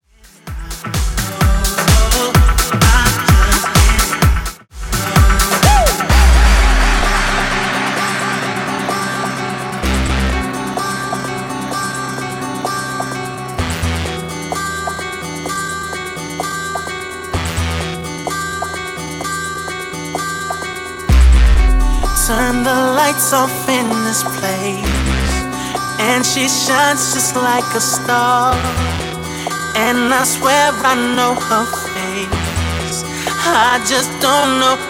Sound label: Re Drum 128bpm